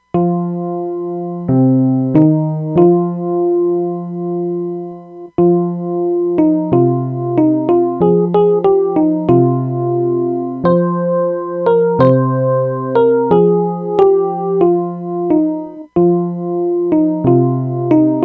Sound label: Karaoke Version